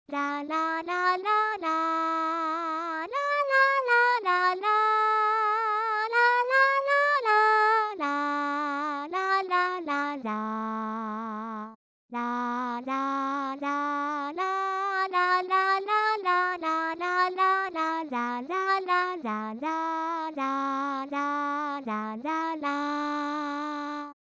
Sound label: eight melodies